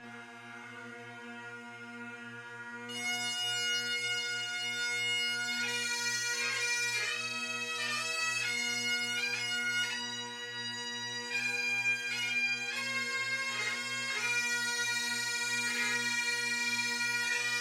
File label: Skye Boat Song